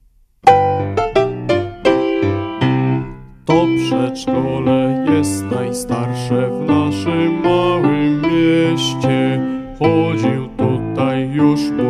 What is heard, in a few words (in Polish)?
hymn przedszkola